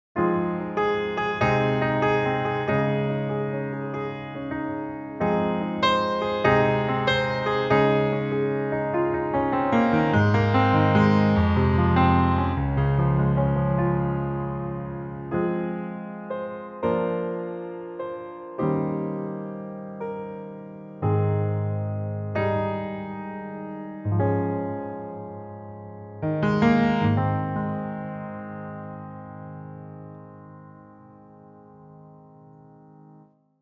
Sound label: Yamaha C7, piano